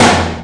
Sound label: TOM low; Hip Hop; STYLE KITS (Hip Hop, Etc); UK Hard Kit